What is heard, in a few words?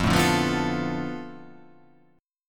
F 6th Flat 5th F down, chord